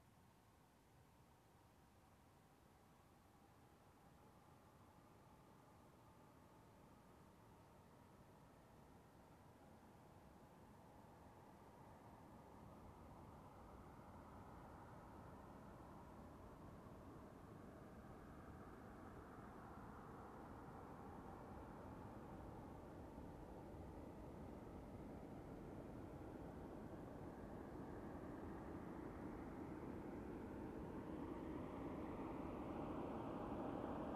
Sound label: Car passing by slowly